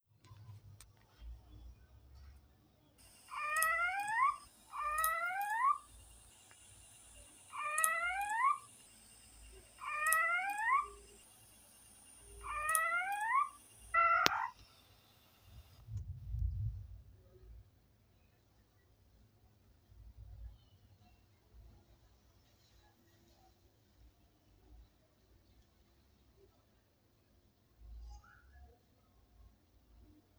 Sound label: Amnirana parva